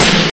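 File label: snare drum, single, noisy, strong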